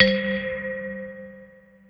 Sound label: SYNTH beauty bell